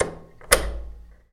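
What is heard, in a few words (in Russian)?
кнопка клик двойной в пространстве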